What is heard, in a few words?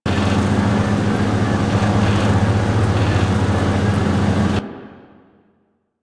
Vent Unit Loop; Ambience; Props; Warframe; Grineer Galleon